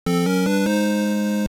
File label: Fanfare, "New Item" fanfare; LOZFDS